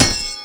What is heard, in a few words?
soft hitnormal